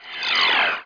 screen vanish

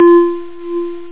synth bell; percussion

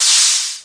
hypo; cdromtoday; startrek

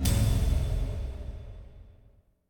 level up; kult

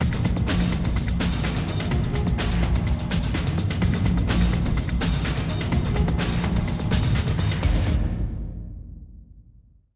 ditty

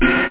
closed, drums

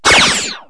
laser zap; KABOOM! - Wild and Wacky Sound Software; battles

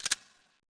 INITIATE LOCK; Sierra On-Line; SWAT2DEMO

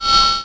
WIPE

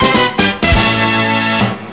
High Score; Fist Fighters

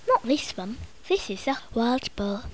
BOAR; Little Red Riding Hood; Koch; Storybook

